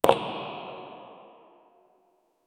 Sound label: low echo chamber